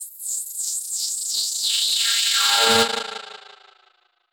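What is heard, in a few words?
alarm siren, filter processed, 170bpm; musicradar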